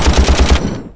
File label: gun; StarSiege